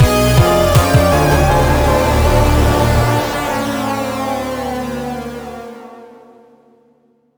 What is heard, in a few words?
Mus Combat Player Lose; Space Tyrant